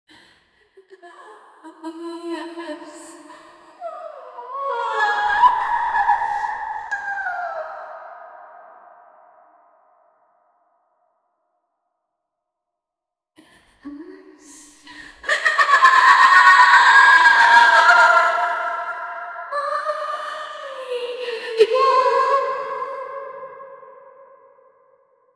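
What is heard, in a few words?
sinister female deity laugh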